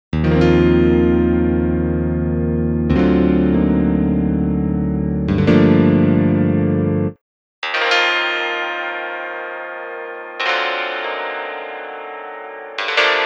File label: bandpass; u-he uhbik